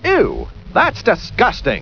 yuck

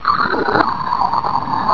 friendly attack; svencoop; portal house

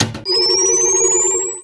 mine activate

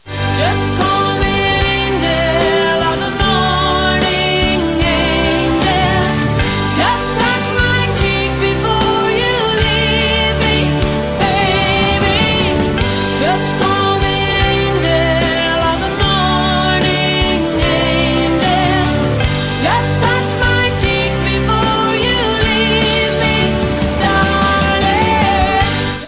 angel loop

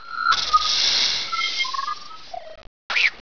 scorp idle; gearbox; lg scorp

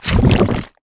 build

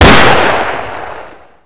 g3 fire; firearms

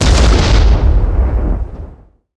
explode; weapons

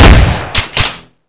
benelli, weapons; brainbread

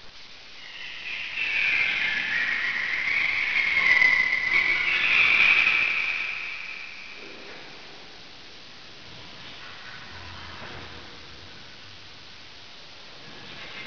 bat squeaks; tfc

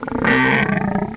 voltigore alert; svencoop

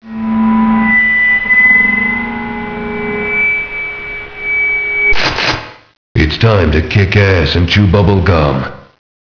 mic room

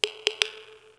geiger

